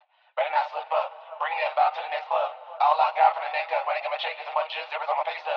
Phonk Vocal 172 BPM; Cymatics; Paystub

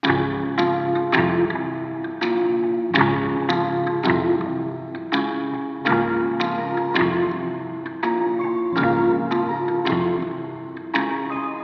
165 guitar loop A-min

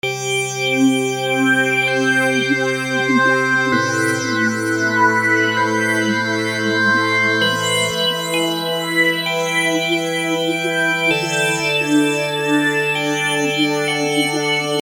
E trance

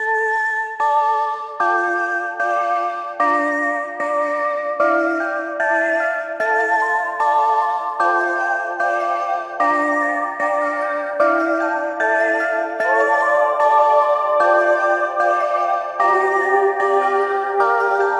Sad Synth 150 BPM Dm

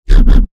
bass stab C; respondpunchbot

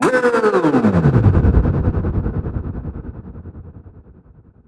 Sweep Down Vocal

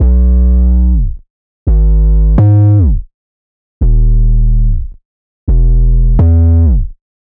loop 808 126 Bmaj

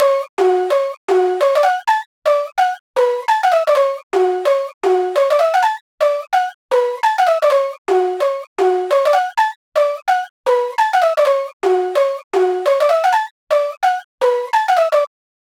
Lead Soul 128 F- Dry

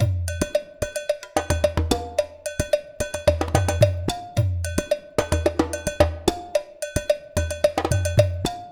Percussion Ensemble 110BPM Ensemble Natural

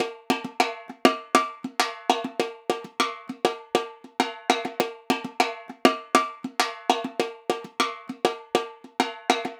Repique Rum Straight 100BPM

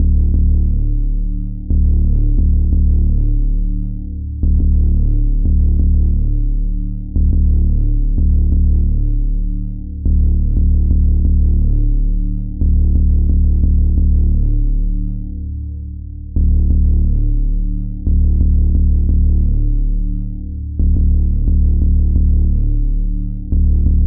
Bass Complete

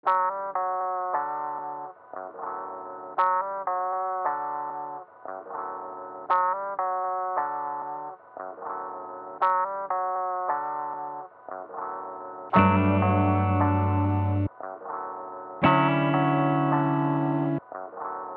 Low Key Guitars

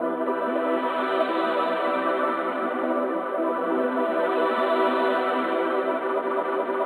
Texture, Serene, 140 BPM, E Min; DUALITY

